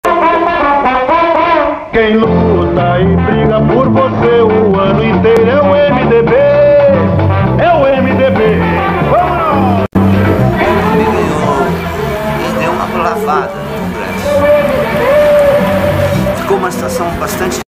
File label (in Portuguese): Jingle; jingles politicos